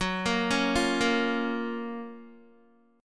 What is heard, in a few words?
Gb Major 7th Gb Guitar